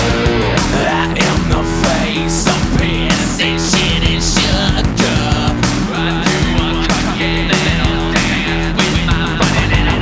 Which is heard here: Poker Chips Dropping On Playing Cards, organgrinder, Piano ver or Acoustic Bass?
organgrinder